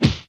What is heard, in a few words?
bonk; Plants vs. Zombies